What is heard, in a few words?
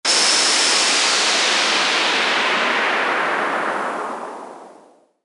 Downlifter Trance